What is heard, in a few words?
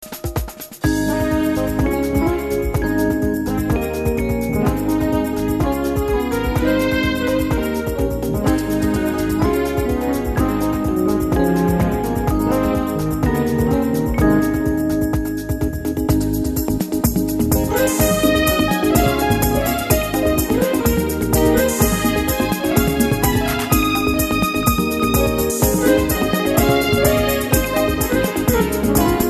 Lounge